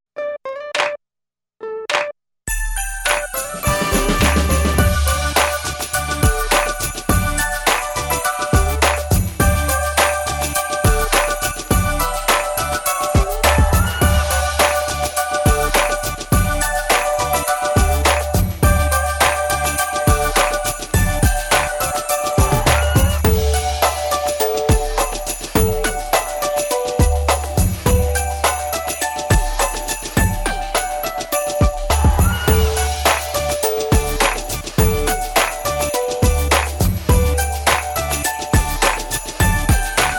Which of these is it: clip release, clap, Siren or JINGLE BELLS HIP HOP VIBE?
JINGLE BELLS HIP HOP VIBE